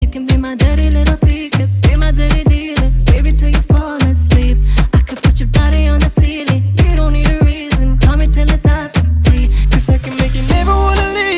Ringtone

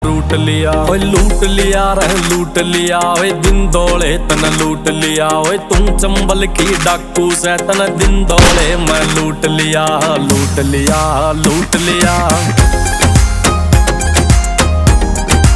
song ringtone Instrumental bgm Ringtone

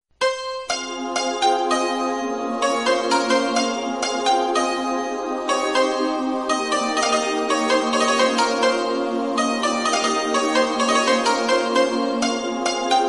Pashto Song Pashto Piano

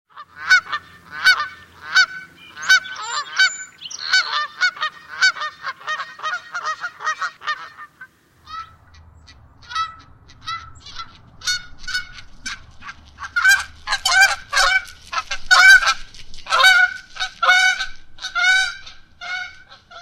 canada goose; iphone ringtone

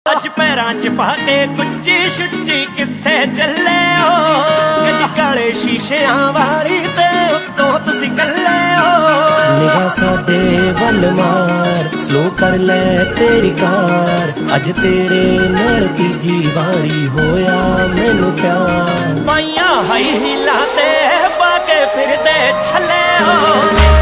new punjabi ringtone romantic ringtone punjabi

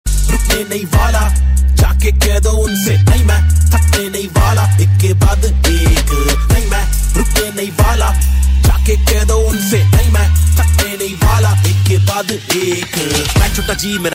ringtone rapper music

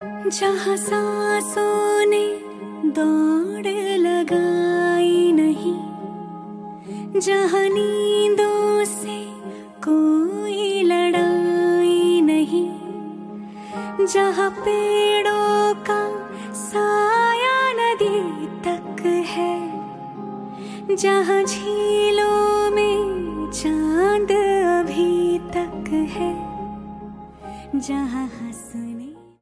ringtone; saina

